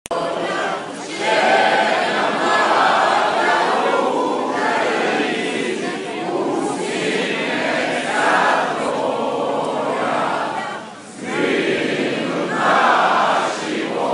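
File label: national anthem of Ukraine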